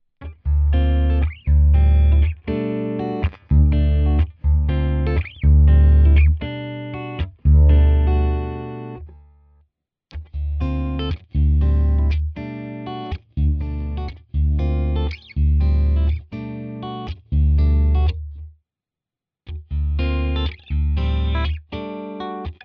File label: clean Groove all positions